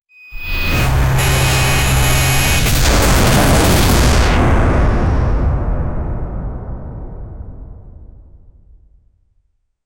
a giant robot self destructing in a massive explosion